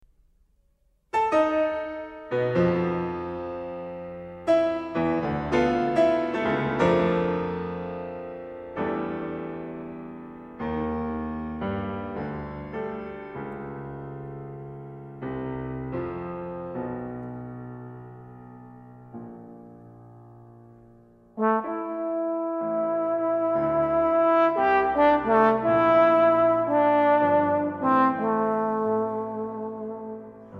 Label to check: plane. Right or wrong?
Wrong